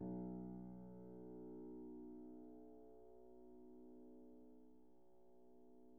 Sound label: piano pp, steinway